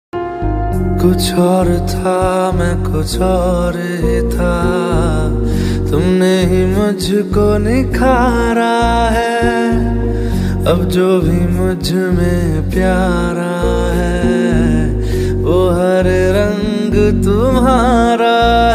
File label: Hindi Sad Romantic Version Ringtone